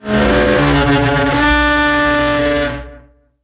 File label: talos die; DAoC